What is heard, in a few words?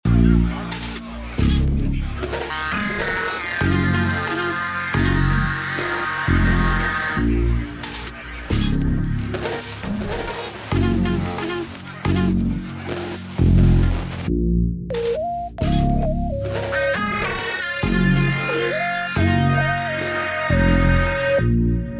Radio Waves 135bpm Bb minor